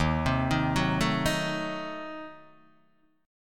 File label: Eb Diminished 7th Eb, Guitar Open D